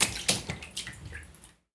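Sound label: footsteps water; ASHIOTO MIZU C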